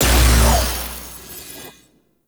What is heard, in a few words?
Portal Transition Stone To Shattered